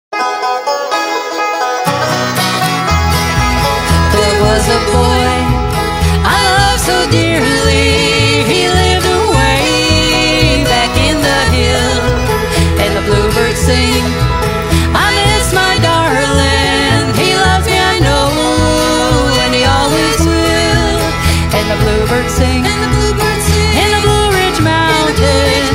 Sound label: Bluegrass